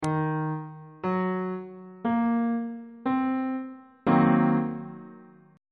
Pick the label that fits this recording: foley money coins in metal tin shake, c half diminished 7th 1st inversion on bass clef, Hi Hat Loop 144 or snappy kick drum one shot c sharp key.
c half diminished 7th 1st inversion on bass clef